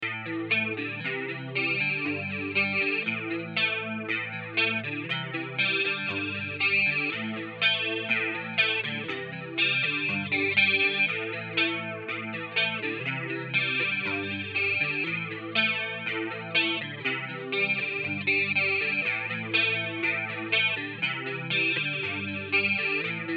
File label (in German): AM-C-G Red Pig mit Chorus; ik multimedia amplitube 4